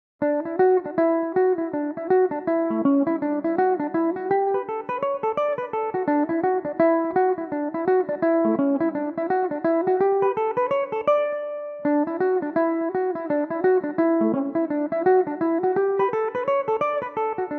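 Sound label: D major, Irish mandolin; Belmuse EM-190 electric mandolin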